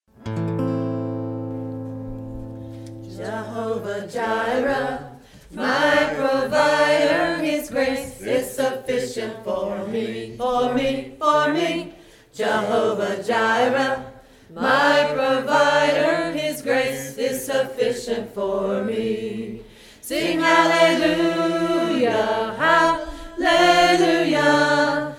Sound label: acappella Am